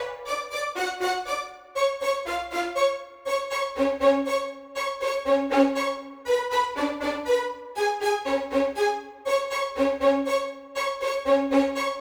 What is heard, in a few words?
violin; playful waltz